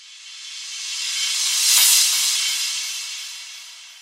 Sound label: suspense sound; gorilla tag youtuber